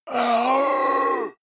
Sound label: monster gasp; kreaturen